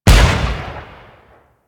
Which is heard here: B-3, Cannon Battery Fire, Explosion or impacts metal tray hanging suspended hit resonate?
Cannon Battery Fire